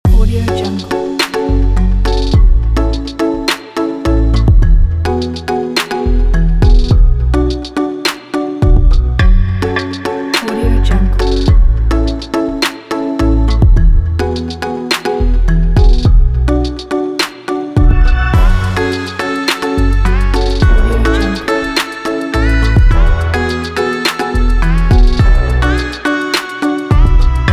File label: tropical groove